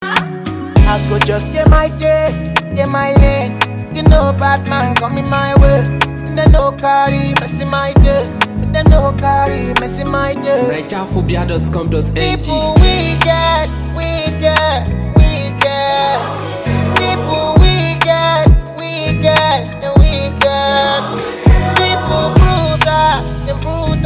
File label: Instrumental